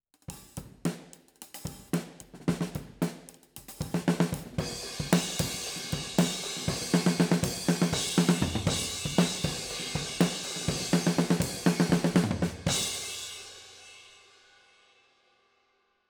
kick head off far

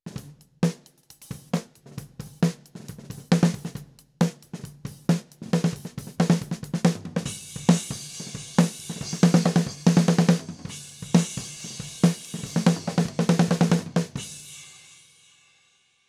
kick distance snr

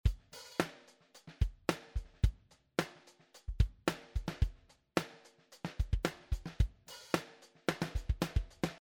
performance loop

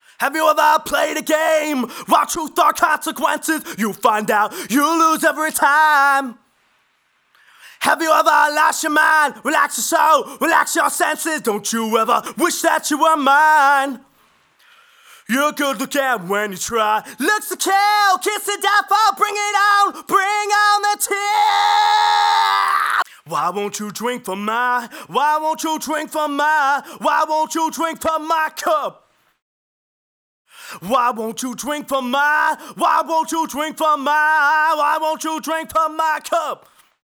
processed lead vocal

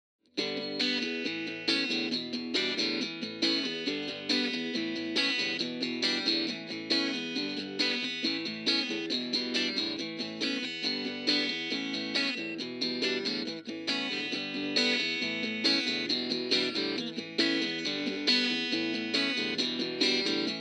processed rhythm guitar

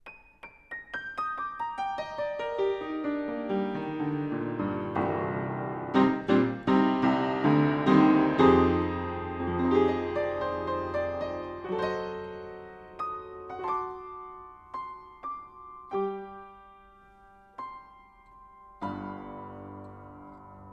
upright panels on 20cm pair

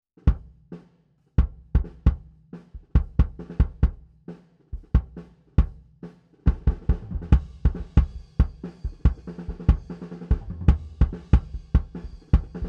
kick distance tunnel D12

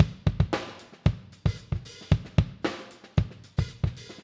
oh filter plus kick

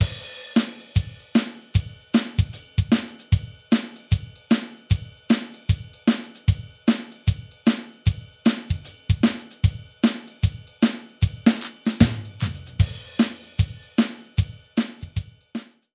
drums orig mix proc

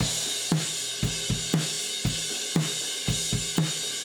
overheads comp

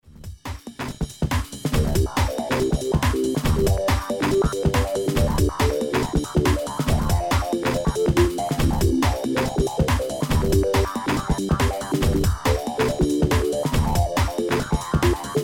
classic auto-filter

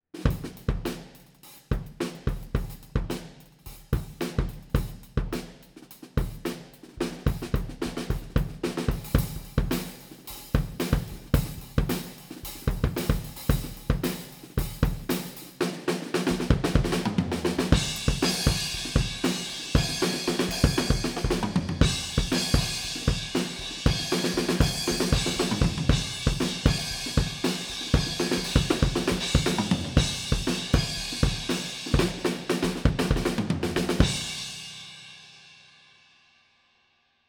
kick head on close; U47

